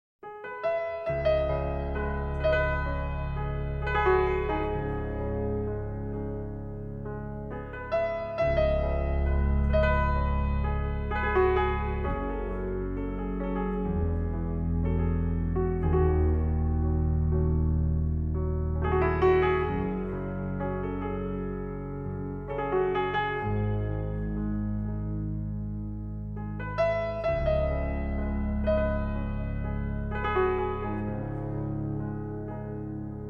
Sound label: Celtic Christmas Mix, Snow On High Ground